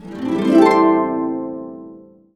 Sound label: C, Harps; USB Soundscan vol.62; AKAI